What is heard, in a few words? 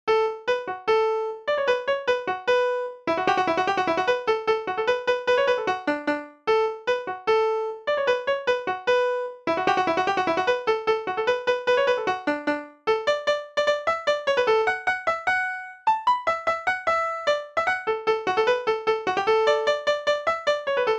piper sligo polka; galway